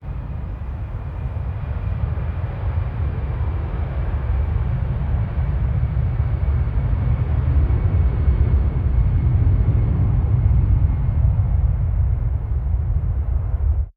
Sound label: airplane flyby; Golden Crayon Sounds